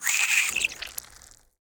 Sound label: Scorpion Die